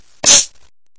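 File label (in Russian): short single sniffle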